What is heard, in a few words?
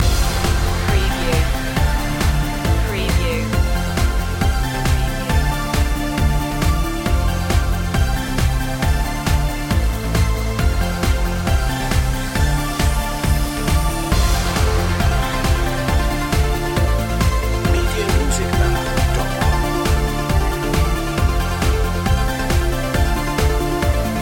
hands held high loop